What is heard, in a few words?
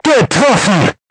hit; tankwar